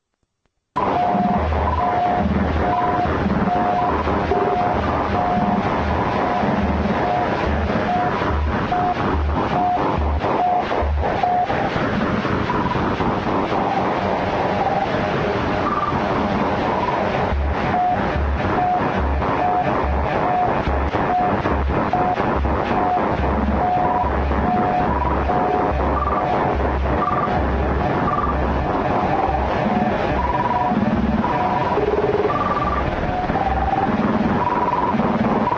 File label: beep jam